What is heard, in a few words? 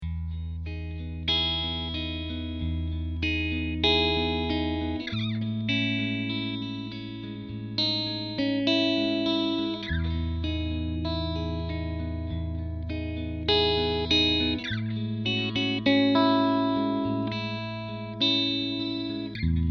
Jazzmaster pickups